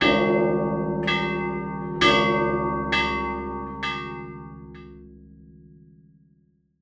A L A R M

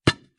Bombsmack

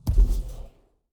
Rocket Launch

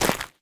Footstep Ice Crunchy Run

